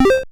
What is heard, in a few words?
keypress